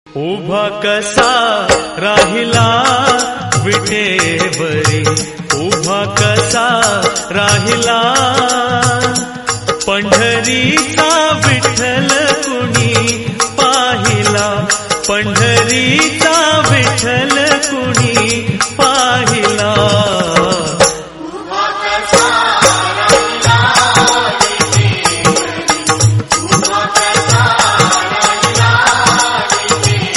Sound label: marathi devotional song